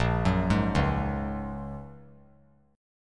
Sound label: Bb Minor 7th Sharp 5th; Bbm7#5 arpeggiated